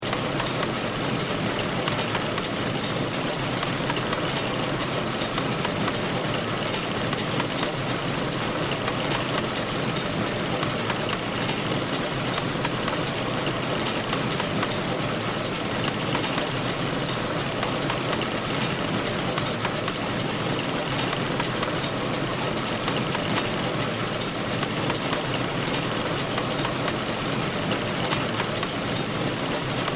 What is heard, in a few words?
Steampunk Engine, Steampunk, Engine, Loop